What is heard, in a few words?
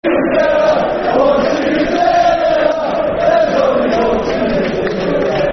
Football Chant (Soccer Song), Newcastle United; One Steven Taylor; Newcastle United Nufc